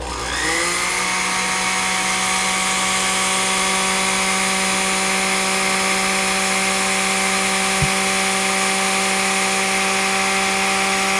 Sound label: blender; E-MU Producer Series Vol. 3 – Hollywood Sound Effects